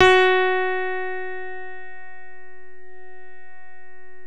CP-70, CP-70 El.Grand; E-MU Producer Series Vol. 2 – More Studio Essentials

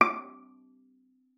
D6, VIOLA PIZZ; Xsample VOL-5; 90 s Sample CDs

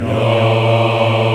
VOX, African Yas; Roland LCDP11 Africa VOL-1

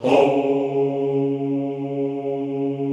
"houuu" C#2, classical choir; Best Service; Extended Classical Choir

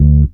BEL; USB Soundscan vol.32; Roots Disco; AKAI

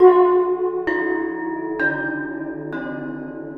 Waterphone Hard Mallet; Sonic Foundry (Sony Creative Software) - Ambient Atmospheres & Rhythms; Ambient-Metals

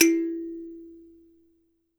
THUMB PI, Kalimbas

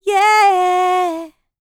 LICKS, Vocal, R&B; ILIO; Dance; LT R+B

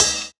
Cymbal, jungle breaks drum sounds

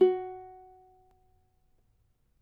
CAVAQUINHO F#, Guitars

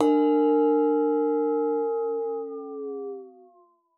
WATER, PERC; Xsample VOL-9

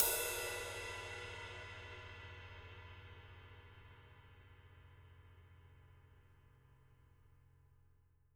rides

